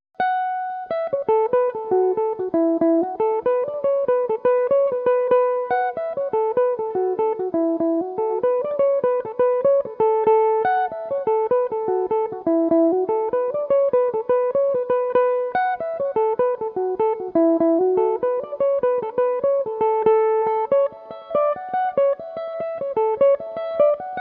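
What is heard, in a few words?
jig A major, Irish mandolin; A Health To The Ladies; Belmuse EM-190 electric mandolin; Recorded for the Mandolin Cafe's Song-A-Week Social Group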